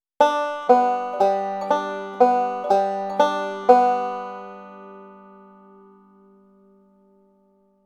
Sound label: backward roll schema